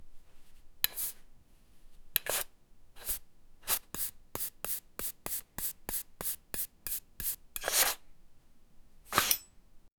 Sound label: blade, melee